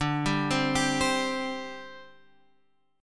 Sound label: D 7th Suspended 4th, down, Guitar, Half Step Down